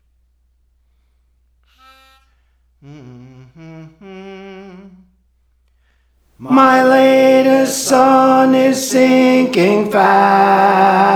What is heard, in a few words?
Tenor, Songs; Praise For The Lord Training; My Latest Sun Is Sinking Fast